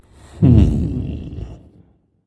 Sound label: thinking; chaac